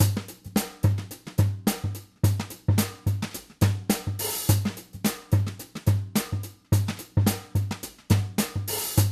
jazz kit; eastwest colossus

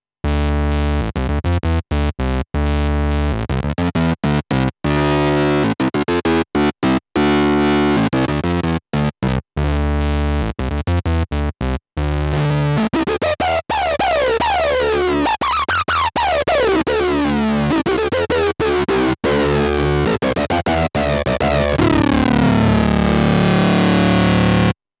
mpc5000 bass xmod sync both; akai

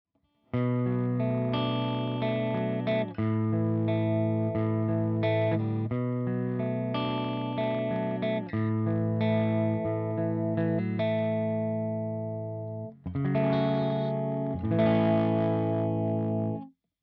crunch mid, gretsch

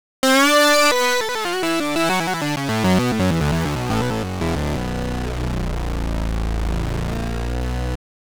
mod sync; fabfilter twin2

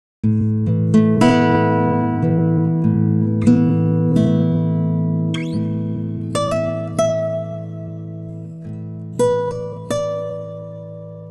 133, timestretch, guitar

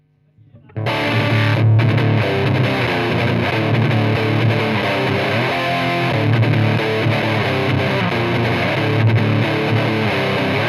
over disto; brunetti pirata141

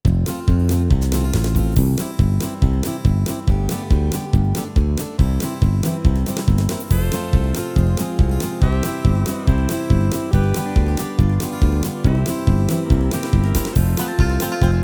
style country bluegrass, yamaha tyros3

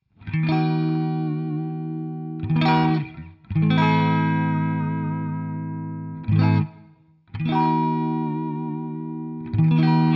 rvb small; tech21 dla rvb

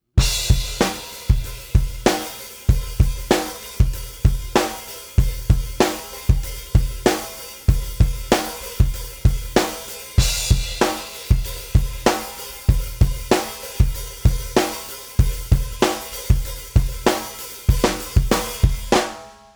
drum

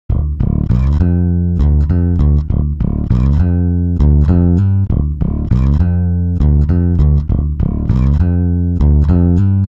bass; ikm sample tank stretch

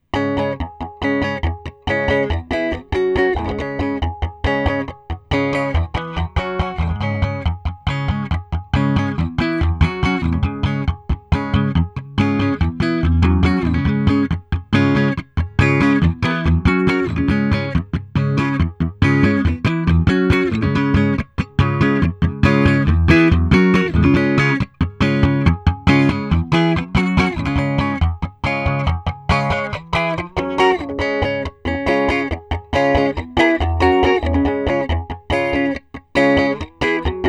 gtr phase variation; little labs ipb

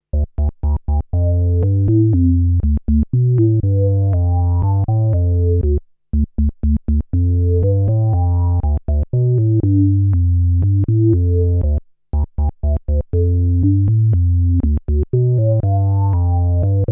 real minimoog square filter lfo; usb ultrafocus

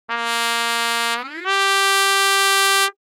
porta slow, trumpet; samplemodeling

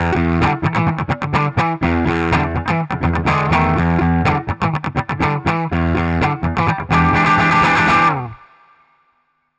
tone zone clean

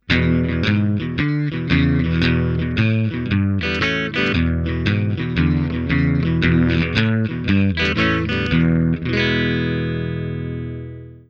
sansamp clean; behringer vtone

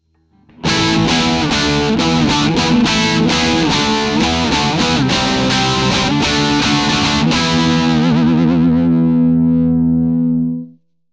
crunch; magix; packplug

